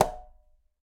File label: Conga A Slap Mute; Latin Percussion; Drums; Drum Hits; Ableton; Factory Packs